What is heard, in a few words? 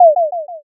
m message